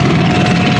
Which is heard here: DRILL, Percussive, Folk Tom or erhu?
DRILL